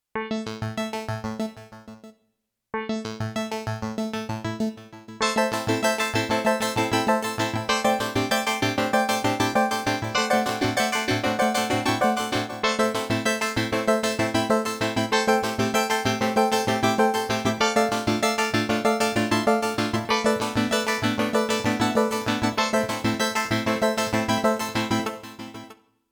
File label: arpeggio